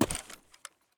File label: gravel footstep